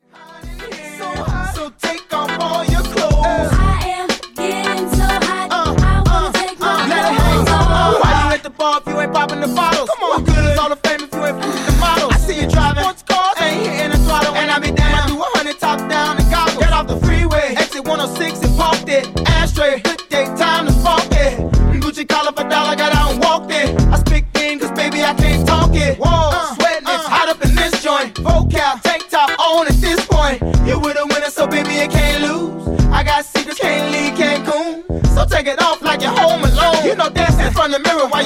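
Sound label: Acapella Intro Breaks Wordplay; Clean n Dirty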